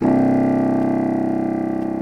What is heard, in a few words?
WINDSEC C1, WIND SECTION; Greytsounds VOL-1